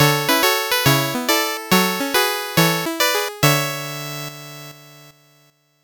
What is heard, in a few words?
8bit jingle slow